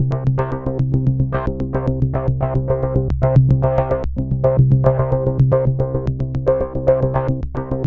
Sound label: MOOG ARPS; Zero-G Ambient 1; BASS ARPS